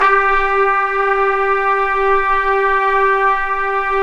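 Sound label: trumpets swell; Roland LCDP06 Brass Sections; Tpts Sec.1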